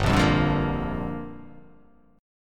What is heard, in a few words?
G Minor 7th